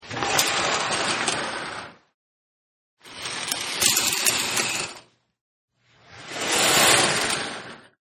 Shower curtain, Shower Curtain Pull Back Sound In 3 Speed Recording: Fast, Slow And Medium; Bathroom Sound Effects